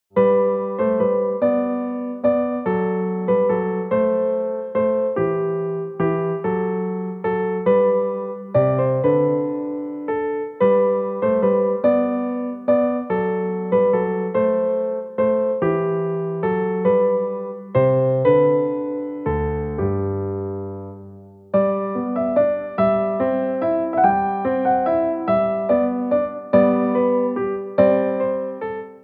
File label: Andante gracioso